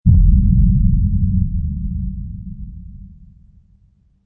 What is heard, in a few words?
Underground Or Distant Explosion, deep rumble; Underground or very distant explosion, deep rumble.